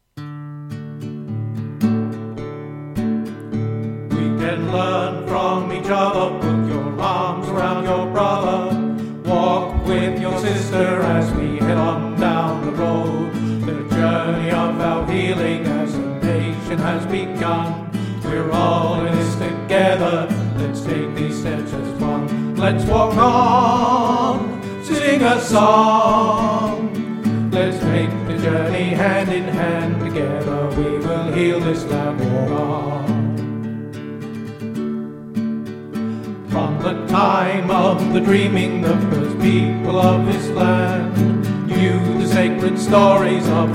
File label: melody vocals